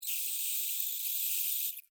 Insect Voice Scream, Insect, Voice, Scream, Vocalization, Bug; Sound Effects:Animals:Insects; Blastwave